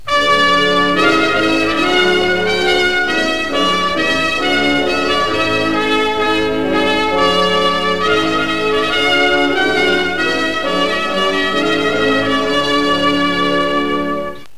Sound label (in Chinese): 激情的背景音乐